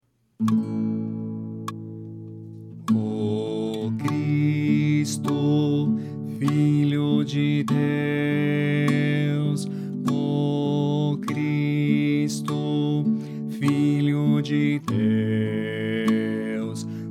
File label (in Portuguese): Baixo